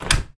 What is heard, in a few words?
door open